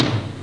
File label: TOM, DRUMMACH